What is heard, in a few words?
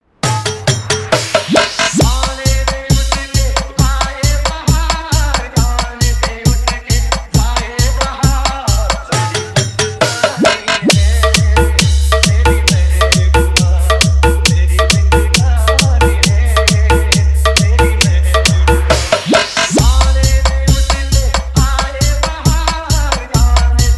Edm Bass Mix